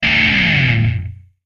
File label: Music E Guitar Disto